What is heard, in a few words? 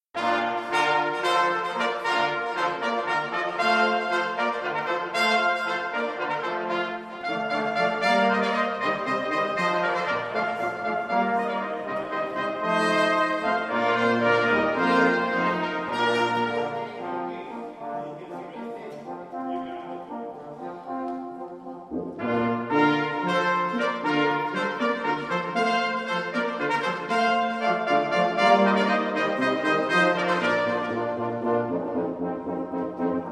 Hornpipe